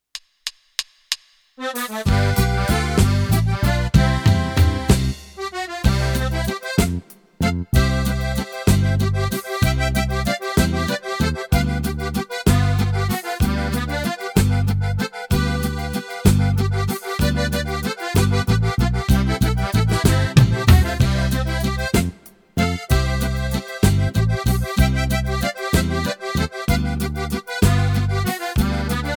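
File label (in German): Frühlingswalzer mit Melodie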